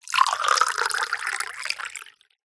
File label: household water pour into small plastic narrow cup